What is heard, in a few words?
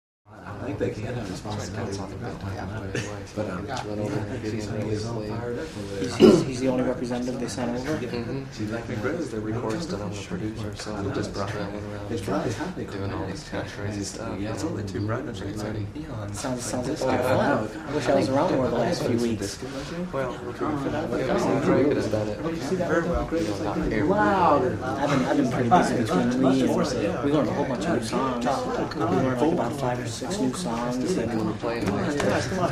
American Males Chatter